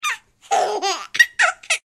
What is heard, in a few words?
baby laugh; CosmicRage; general Baby